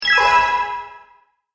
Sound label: A Classic Game Spell, Magic; Andrew Fleming